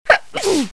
Sneeze

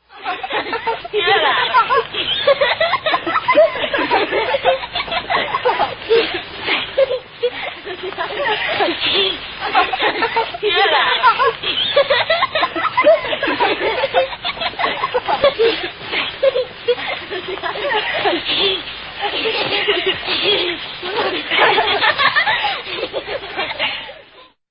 Two Children Laughing

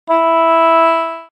Oboe E4; Mono

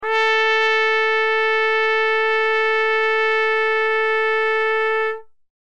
C trumpet A4

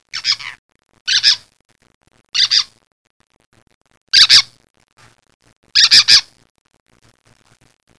BIRD TALK

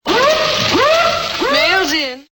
mail in

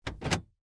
car central lock

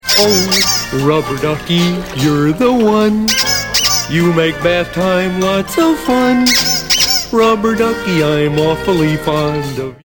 rubber duckie

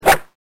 drive

